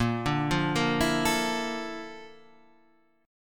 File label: A sharp Major Sharp 11th, Guitar, Standard; A#M#11; arpeggiated; JGuitar